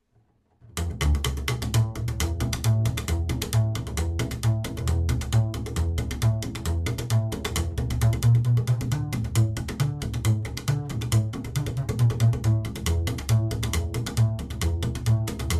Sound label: Clef Guts with olive E-swing